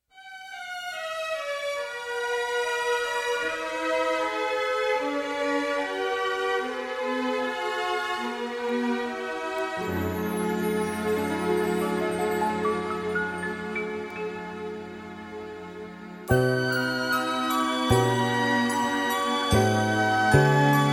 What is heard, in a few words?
old love song